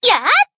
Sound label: jump; asuna